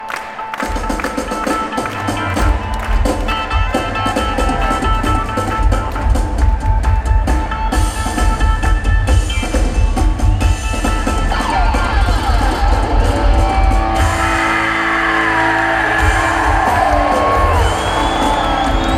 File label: Drum Solo; Madison Square Garden, NYC, NY; December 5, 2012